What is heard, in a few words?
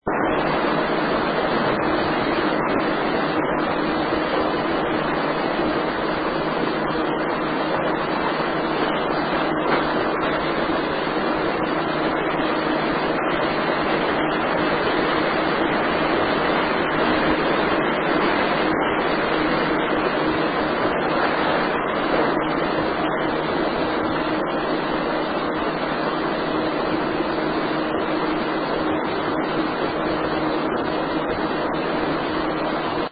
museum noise not very clear